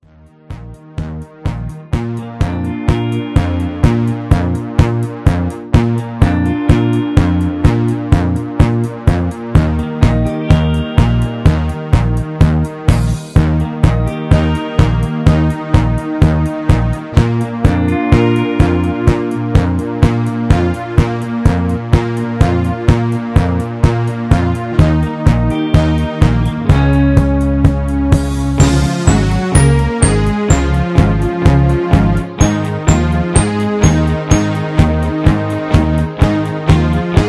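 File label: Instrumental No Leads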